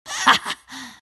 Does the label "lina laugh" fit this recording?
Yes